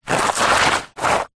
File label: foley footstep sneaker scuff movement dirt